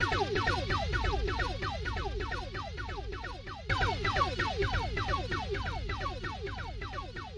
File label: lofi bleepy echoes